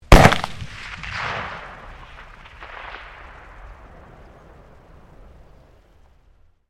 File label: RIFLE MAG-1 FIRING; Rifles, non military; Rifle Sound Effects; Rifles (Sound Effects:Guns)